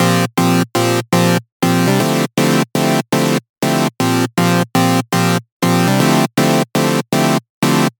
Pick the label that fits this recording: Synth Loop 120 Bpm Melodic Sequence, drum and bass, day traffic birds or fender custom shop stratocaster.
Synth Loop 120 Bpm Melodic Sequence